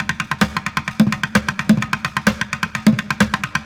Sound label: Street Groove, Ambient Grooves